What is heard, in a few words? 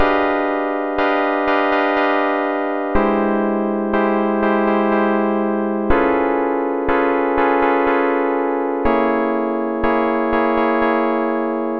122 BPM Tonic G Gmaj Fmin Chord Progress; mmb chord progressions essentials